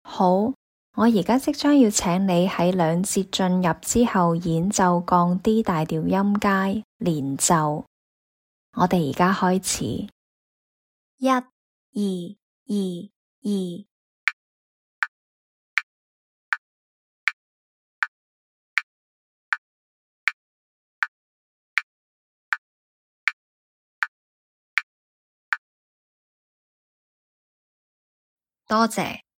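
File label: D flat major scale legato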